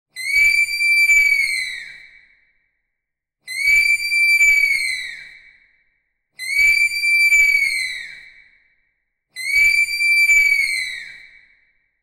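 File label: Lenivec zvuki lenivca, sloth sounds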